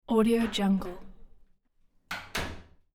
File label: wood door open and close with knob turn; hollywoodedge